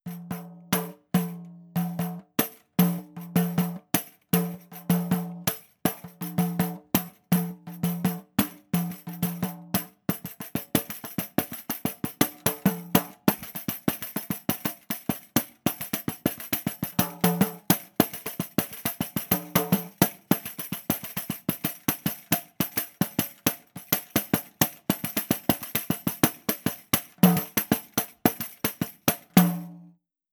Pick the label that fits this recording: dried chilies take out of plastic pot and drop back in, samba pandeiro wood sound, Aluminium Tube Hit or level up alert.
samba pandeiro wood sound